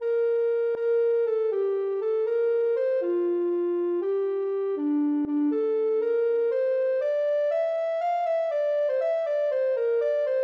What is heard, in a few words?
bubble, ocarina